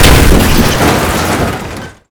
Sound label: Impact Car High